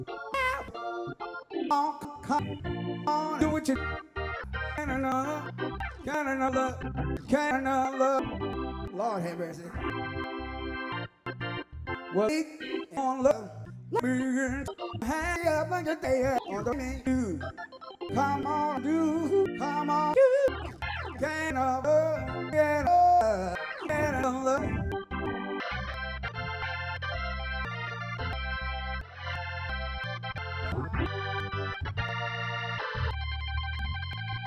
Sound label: Stereo Mix; NAMM 2018; MyMix Recordings NAMM 2018